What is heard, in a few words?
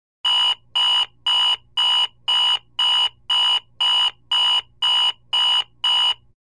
Digital Alarm Clock